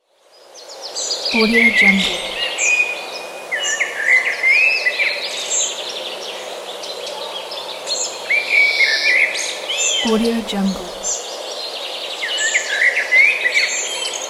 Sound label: birds in forest